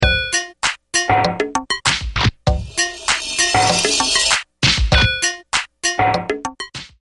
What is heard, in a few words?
tech tone